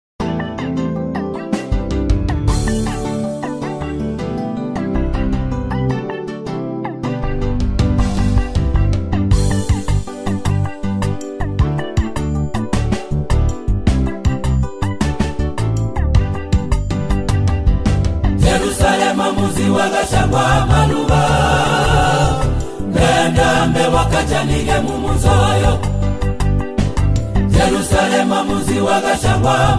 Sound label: Warning Bells